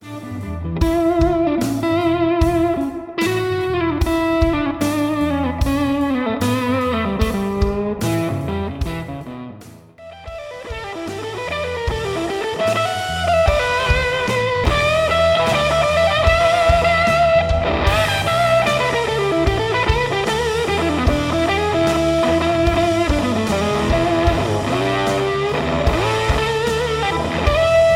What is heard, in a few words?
68 Red Velvet FUZZ; Chase Tone